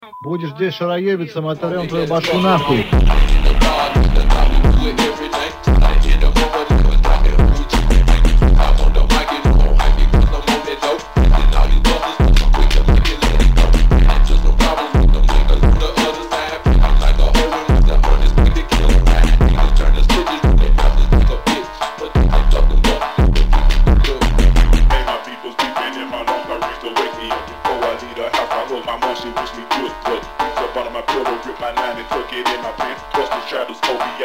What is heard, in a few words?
Lowbass remix